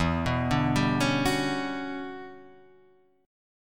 E Minor 13th guitar chord, Half Step Down

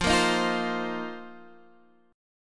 F 7th Suspended 4th F down Guitar Standard; strummed; JGuitar

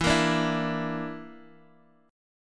Bb Major Flat 5th E, chord

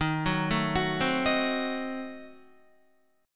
D sharp Diminished 7th, Guitar; D#dim7 arpeggiated; 10,9,9,11,12,11; 4F,4A,5C,5G,5C,6E

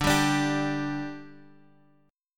G 5th D, chord

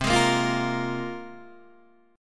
C 7th Suspended 4th Sharp 5th, Guitar, Open D

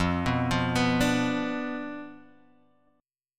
D Minor 6th F, guitar chord

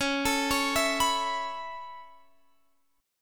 C sharp Minor 7th Sharp 5th, Guitar Standard; C#m7#5; arpeggiated; JGuitar

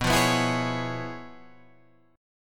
Db 7th Sharp 9th B, chord shape

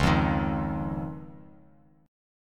Db Major Add 9th, Bass Standard; strummed; JGuitar

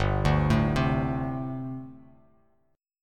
G Suspended 2nd Suspended 4th, Bass Standard; Gsus2sus4 arpeggiated; JGuitar

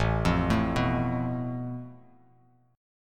A Suspended 2nd Sharp 5th, Bass Standard; arpeggiated; JGuitar

